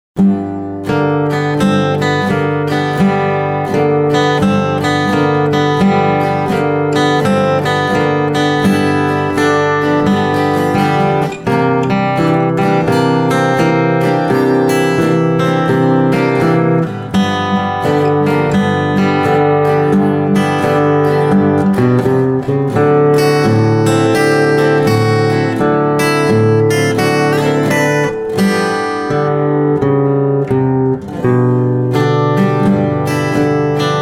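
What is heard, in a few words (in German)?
Gitarre Solo; blues; ragtime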